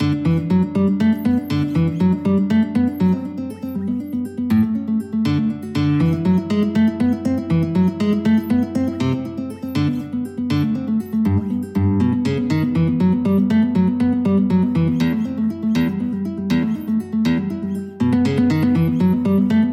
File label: caprice, guitar; carcassi